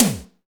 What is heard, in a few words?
DEEPE TM HI; Drums In Your FACE!; NEWJACK